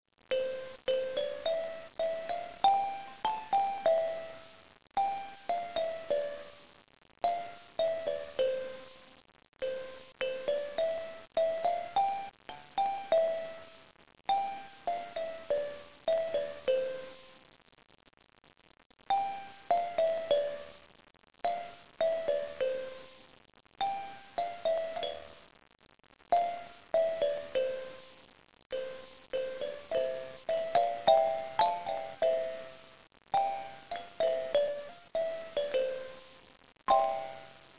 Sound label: kalimba